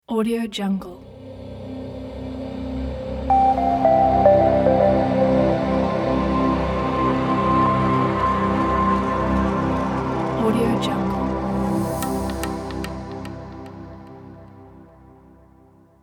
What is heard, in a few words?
ambient logo